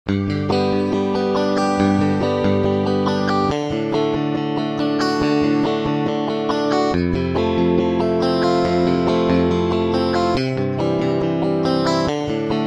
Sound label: European Romance; meloboom